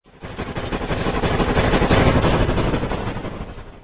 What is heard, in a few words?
train big